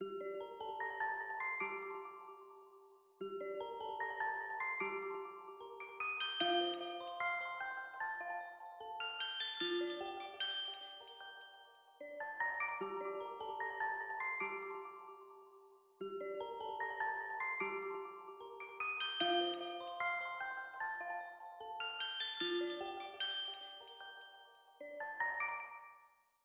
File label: 150BPM